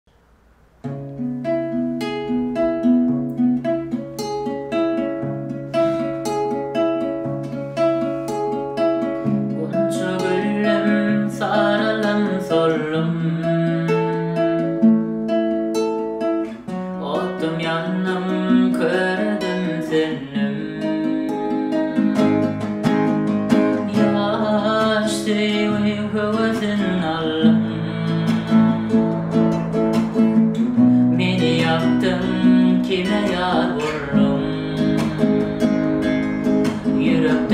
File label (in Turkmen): türkmen gitara